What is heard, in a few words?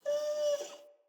Wolf Cub Trapped Call Close